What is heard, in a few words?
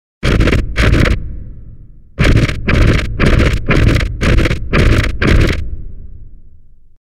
Robotic footsteps